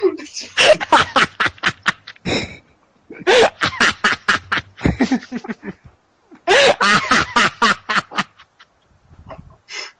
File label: hahaha